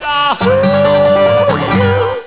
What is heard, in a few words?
the yodel; Yahoo Messenger